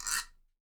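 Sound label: guiro, Percussion; Miroslav Vitous - Percussion & Harp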